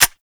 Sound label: Quad Sniper Low Clip; Warframe; Weapons; Tenno